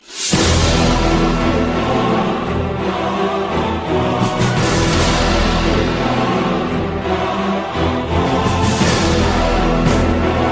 Epic Music

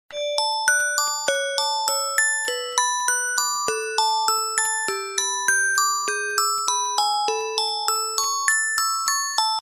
Beautiful Melody

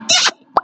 Squeaky Pop

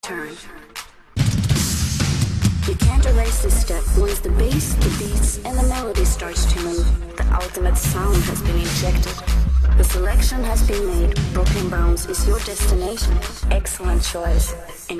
Bass

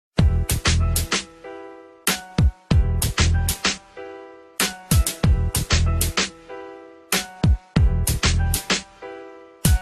Beat

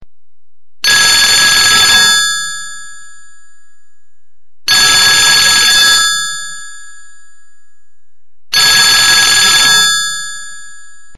Long Ring

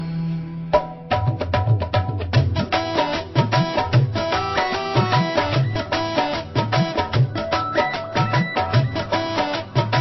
Tone For Cell Phone

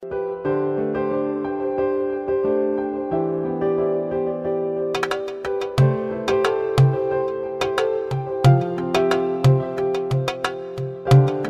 Arabic

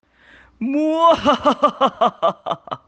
Evil Laugh

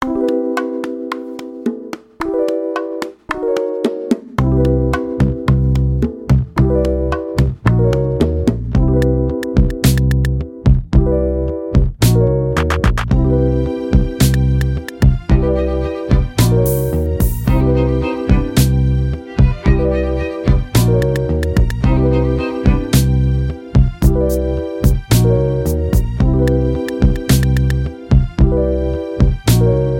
Chillwave

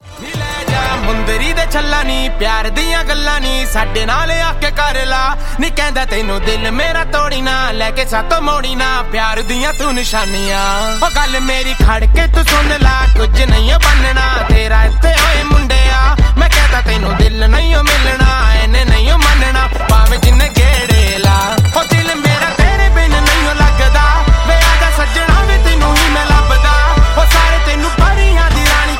Drumroll

